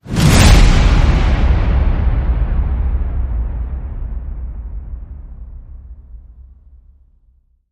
Big Whoosh

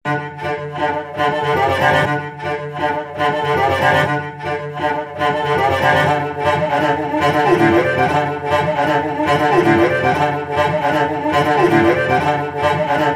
Cello Danger Excitement

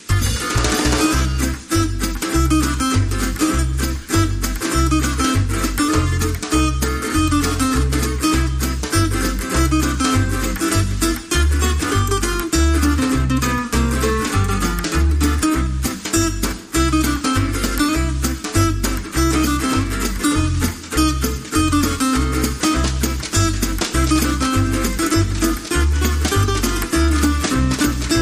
Gypsy Jazz

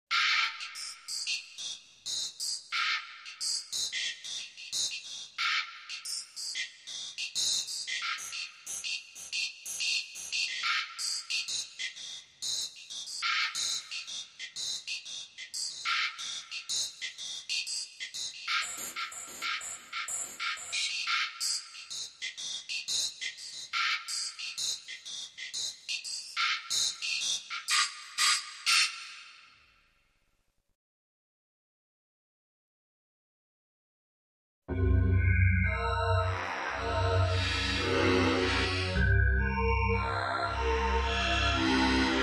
Rhythm

